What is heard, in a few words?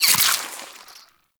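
Infested Splitter Gore Spew Projectile By; Warframe; Grey Strain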